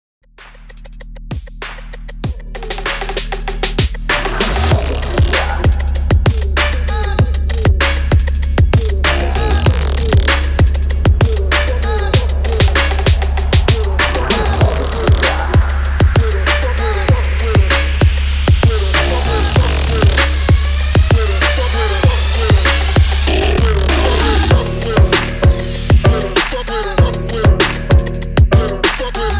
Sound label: TWERK 97 BPM